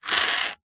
leisure poker dealer button chip scrape rim edge on concrete